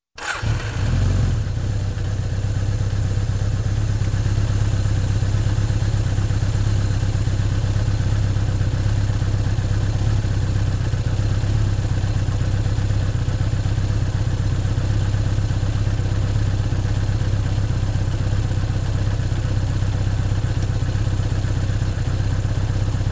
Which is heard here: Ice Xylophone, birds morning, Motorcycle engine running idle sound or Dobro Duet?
Motorcycle engine running idle sound